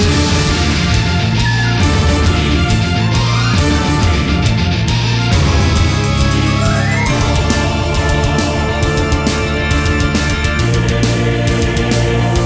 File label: Bar Fight; Orcs Must Die